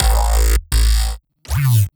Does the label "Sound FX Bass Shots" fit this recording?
Yes